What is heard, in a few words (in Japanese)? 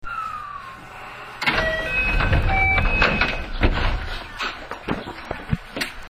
ドア開け, 223系1000番台; 2000番台と仕様をそろえた結果、ドア開閉時に「カラカラ・・・」となるように。; 紀州路快速を設定する際に投入。